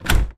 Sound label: door close